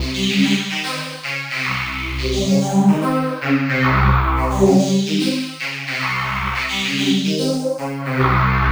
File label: Sequence, Drums & Drones